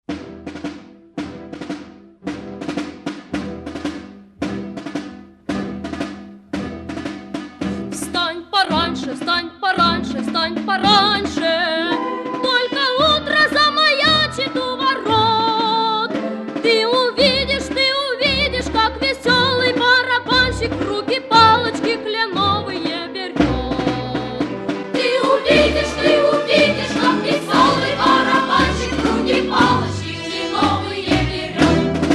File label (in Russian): detskiy hor